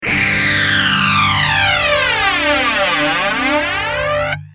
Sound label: gtr ibanez fl99